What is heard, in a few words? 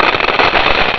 tick